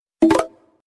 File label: notification sound; grindr